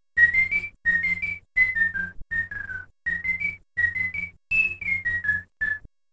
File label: Whistler